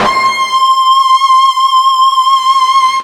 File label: FUZZ C 5 L, Guitar; East West; Steve Stevens Guitar Samples Collection